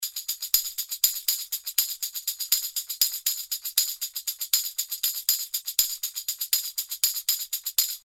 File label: 120 tambo small sixteenths